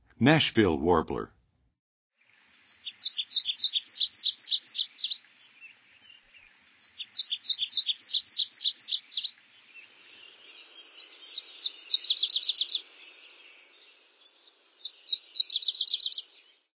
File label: Nashville Warbler, Songs of Birds of North America; Western USA; Western USA Songbirds